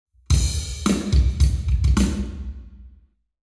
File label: Hall, Drums 0deg